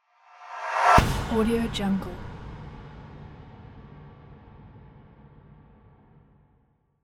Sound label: whoosh boom